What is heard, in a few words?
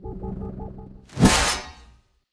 Nomal Attack; starbites